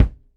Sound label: V20 Kick; Digital Sound Factory